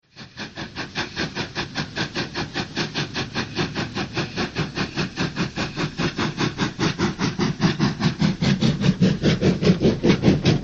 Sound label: steam, trains; STEAM LOCOMOTIVE